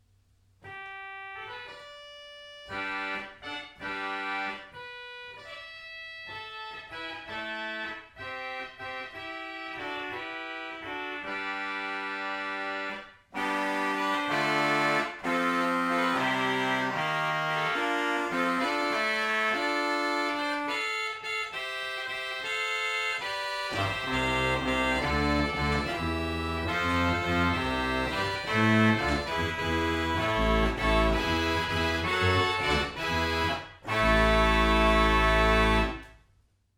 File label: reeds, organ